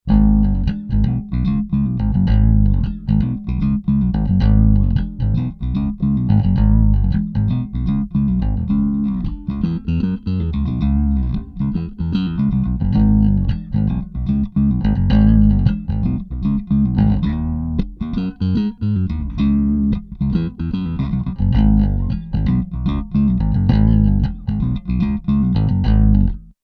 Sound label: riff